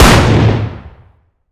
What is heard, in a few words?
fire dist 50ae pistol int; gmod; arccw uc commons attachments